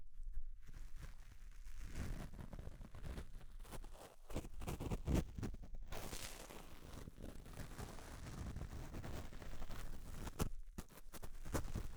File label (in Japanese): 綿棒 右